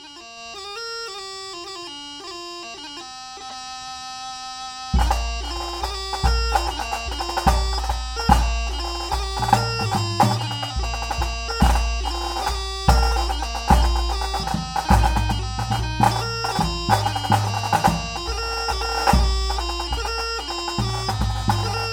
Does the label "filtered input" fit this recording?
No